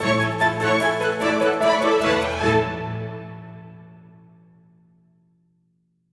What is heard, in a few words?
STING; HUB KITCHEN SHOW